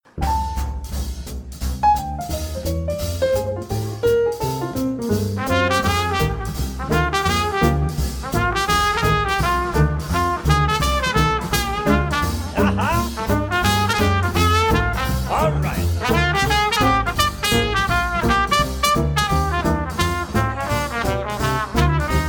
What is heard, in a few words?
stomp stomp, Jazz; Jazz Pour Tous